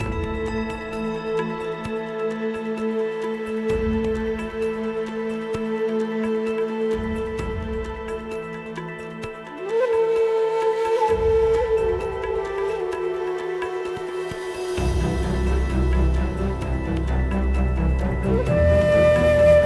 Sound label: Music After Charge Jump; Ori and the Blind Forest; Valley Of The Wind